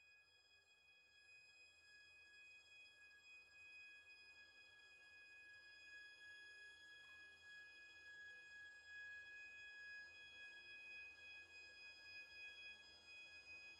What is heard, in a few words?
spooky strings